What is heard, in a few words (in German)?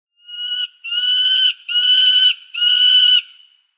Wanderfalke Falco peregrinus, Vogelstimmen